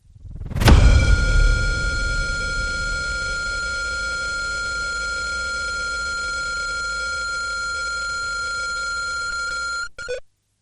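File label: Hit Horror Frequency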